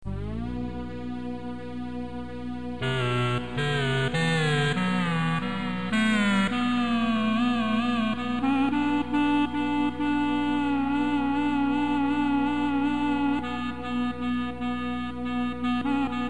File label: Clarinet